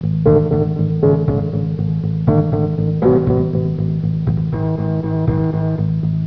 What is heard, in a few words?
HLoop; HotLeather2